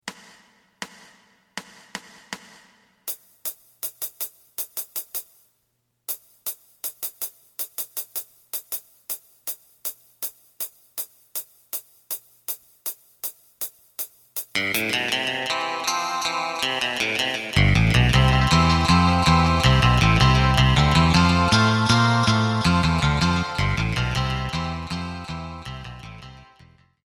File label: drum